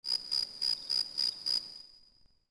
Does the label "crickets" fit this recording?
Yes